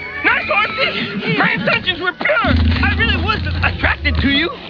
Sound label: pain